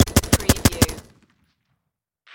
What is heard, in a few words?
Machine Gun HKG36